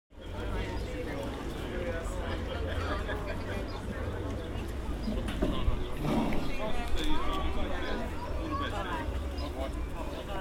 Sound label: harbour outside fish shop; whitstable